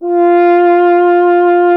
F. Horn; Miroslav Vitous; Solo Instruments VOL-2

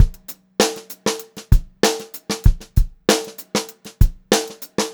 FUNKY BEAT, Funk-Groove Drumloops; USB Soundscan vol.38; AKAI

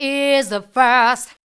VOC F, Dance Vocals, FEMALE; Best Service ProSamples vol.12; AKAI

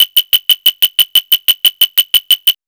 ARP2600; AMG - Vince Clarke Lucky Bastard

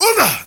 MALE SOLO, MALE NOISE, Heart of Africa

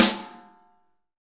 SN ambient; Best Service; Real Mega Drums VOL-1

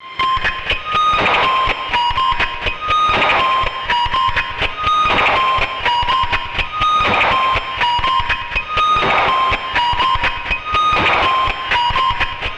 C5, Synth Line; 90 s Sample CDs; NiceBeats; SynthLine VOL-13

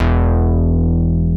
Moog bass; Greytsounds VOL-1

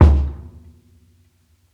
KICK SOFT; Basement drums vol 2

